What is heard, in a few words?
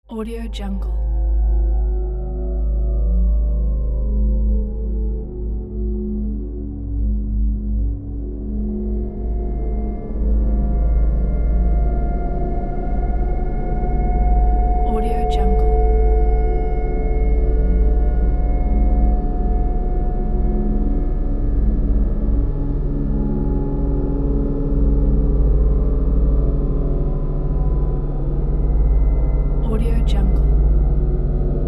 space texture sci-fi